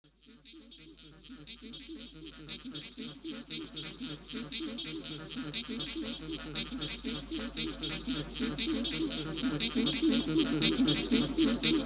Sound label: Vocalise